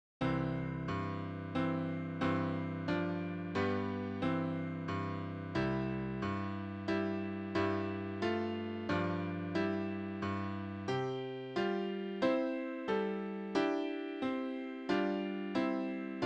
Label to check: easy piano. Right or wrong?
Right